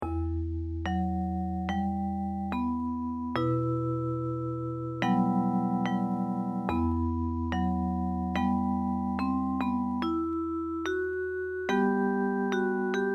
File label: japanese folk song; nanbu ushioi uta